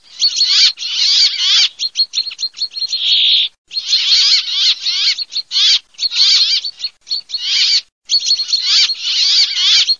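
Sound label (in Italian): fringuello peppola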